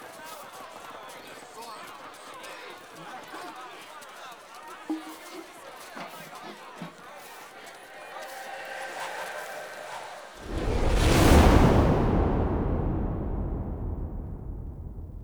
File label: Landing Ambience; Cinematics; Cetus Landing